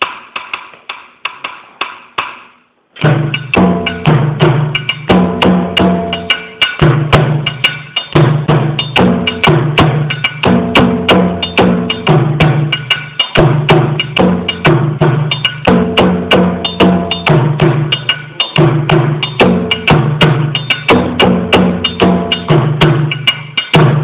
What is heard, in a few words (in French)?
Kuku Dunumba Sangban Cloches Normales